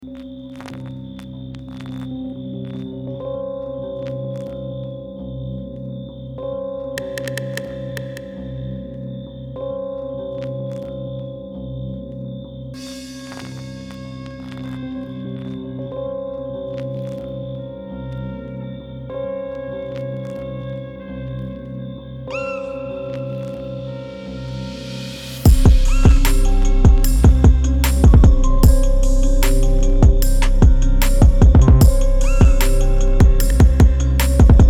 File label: Instrumental